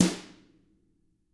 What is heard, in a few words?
TAM1BR F R-L, Double Platinum Drums; TAMBKIT